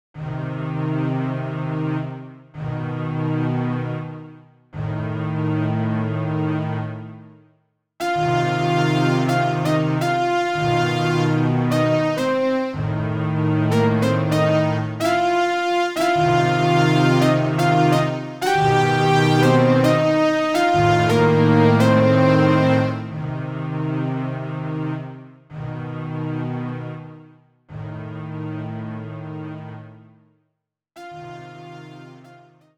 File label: Roland SC-88; SimCity 2000